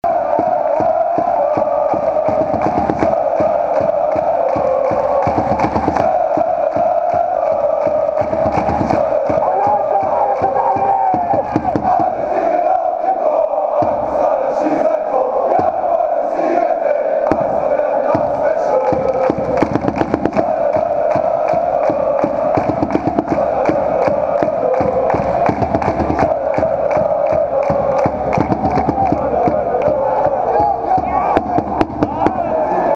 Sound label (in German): Alle singen laut im Chor; Aachen schalalalalalalalaaaaa